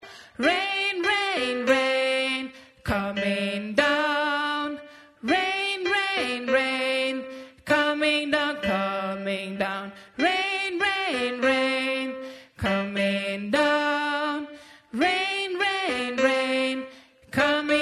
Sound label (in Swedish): bas